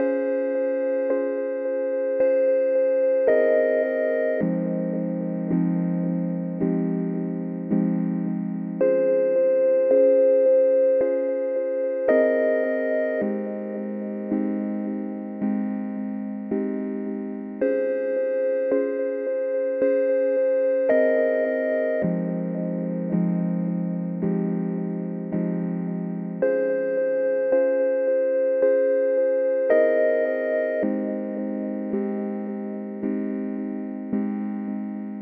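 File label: Afro Melody Loop F minor 109 BPM Keys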